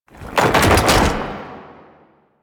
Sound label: Archgun Charged React; Warframe; Archwing